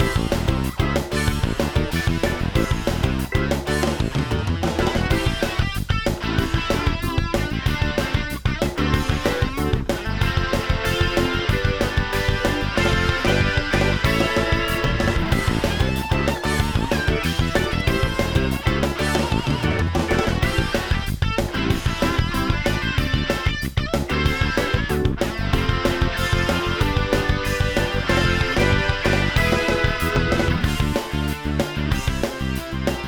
music school main loop; horrid henrys krazy karts

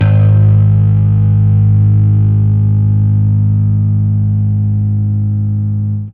Fuzz G sharp 1; Torchlight III; PS4

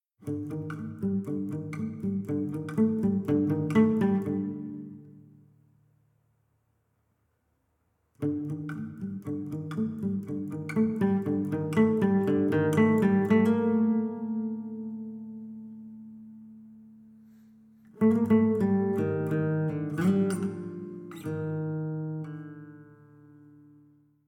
Savannah Music

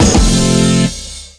short level won end; Tennis in the Face; PS Vita

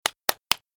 Clap; F.A.M.P.S

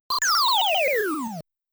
Alien -Appearance; Gaplus

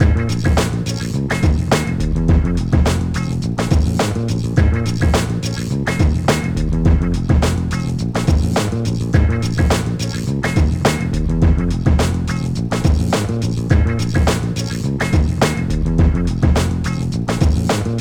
Load Loop; NBA Street; GC; PS2

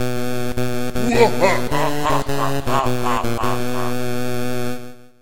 Jingle Mission Failed Zombie; Dandy Dungeon Legend of Brave Yamada

